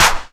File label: KLAP, Big Beat; Big Beat Samples & More; AKAI; CLAP KIT